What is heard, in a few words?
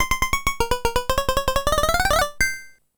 Jingle; gamerip; elevator action deluxe; ps3